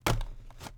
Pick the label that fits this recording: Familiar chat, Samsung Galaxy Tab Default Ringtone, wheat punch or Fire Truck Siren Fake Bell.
wheat punch